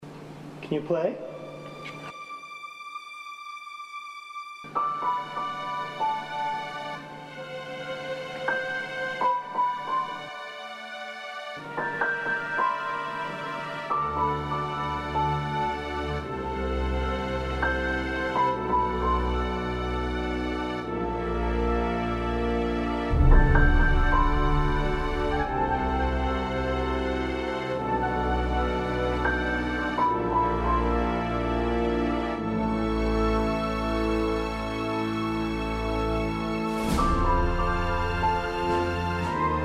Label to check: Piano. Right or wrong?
Right